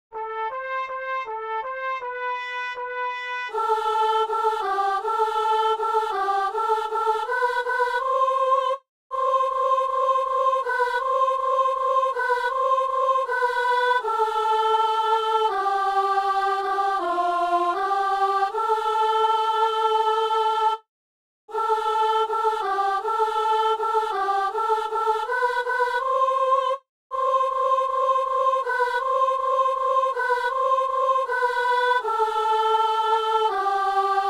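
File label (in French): célébrant et soprano assemblée; Messe de Saint Paul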